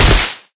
Tiger Crash; JungleWarrior

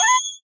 jump

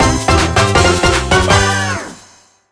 Bgm open chapter; chapter03

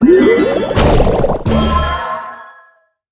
agar agar skill start; cookie run

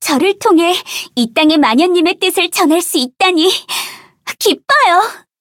levelup; cookie run

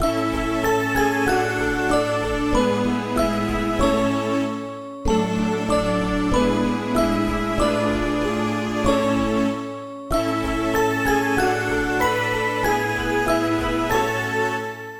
Bgm theme simple; Cookie Run

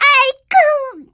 hit; cookie run

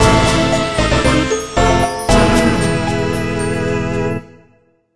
Bgm open chapter; chapter05

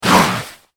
Slide Tiger Form; Cookie Run

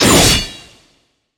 skill attack vertical; red velvet; Cookie Run

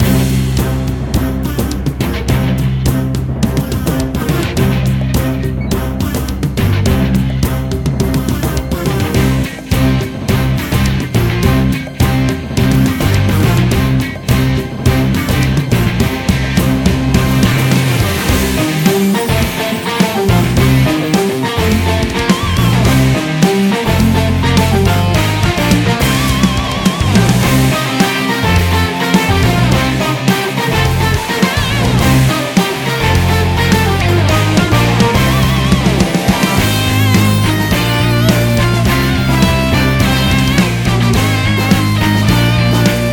BGM Rock ver.; Cookie Run

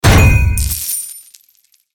Golem Jump End; Cookie Run

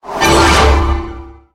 Transform Start; Millennium Tree

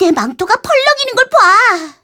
levelup; Cookie Run

